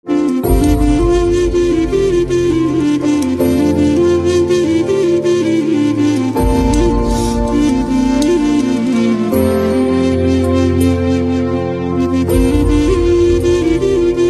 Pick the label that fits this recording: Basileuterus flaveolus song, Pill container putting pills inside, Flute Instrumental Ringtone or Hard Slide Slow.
Flute Instrumental Ringtone